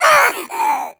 death; creep; greevil

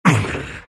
big pain; Undying

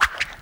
natures grasp layer; treant

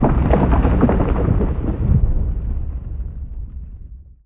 arena crumble; weapons; dota; mars

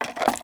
bucket foley; movement; tusk

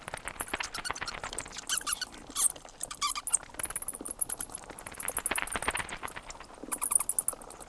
bats; ambient soundscapes; dota

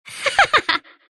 laugh; dota; dark willow

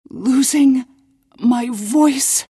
pain death; queenofpain

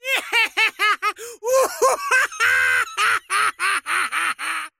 wheel laugh; dota; largo

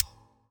panorama generic button click; ui

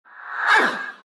pain; dota; death prophet

